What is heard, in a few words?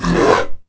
Hulk Roar; The Elder Scrolls III Morrowind GOTY; XBOX